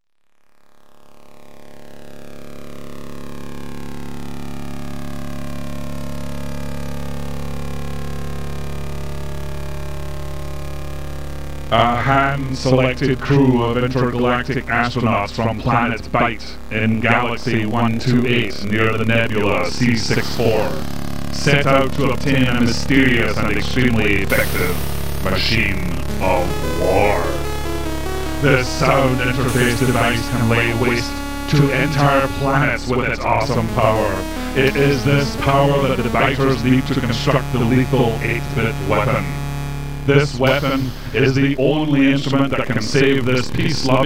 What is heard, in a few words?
8bit intro; project firestart